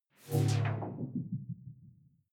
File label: sci-fi critical bell filter